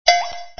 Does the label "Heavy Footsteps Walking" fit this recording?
No